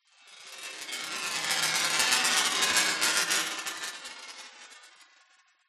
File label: industrial ball bearing metal roll glass surface